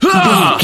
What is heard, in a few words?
voice male attack groan